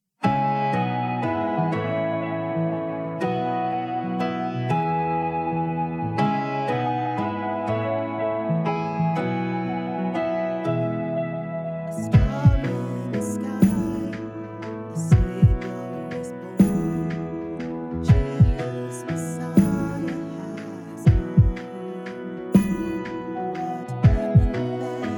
Tenor w Lead, christmas choir

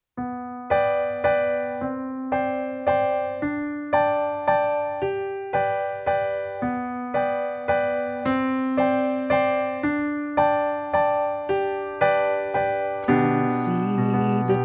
Full Choir

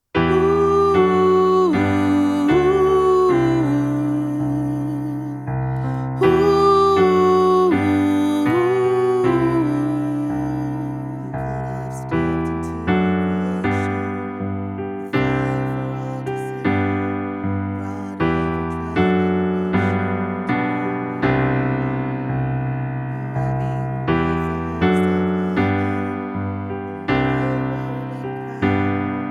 Alto w Lead, christmas choir

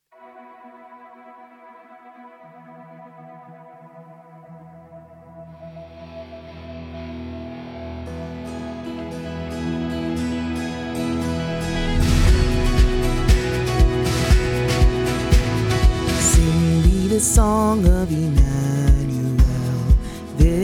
Lead Only, christmas choir

